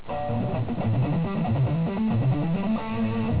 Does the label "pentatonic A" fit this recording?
Yes